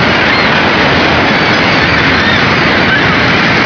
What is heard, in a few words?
ocean heavy; PC Gamer IT CD 41; Daikatana